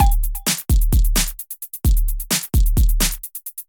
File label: laid back, edm drum samples